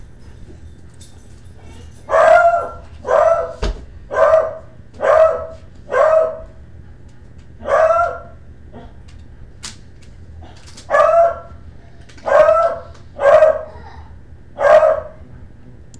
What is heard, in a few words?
Beagling